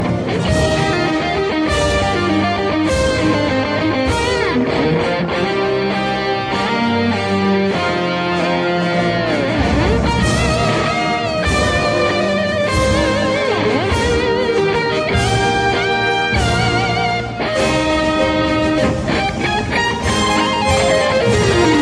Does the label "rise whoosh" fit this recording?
No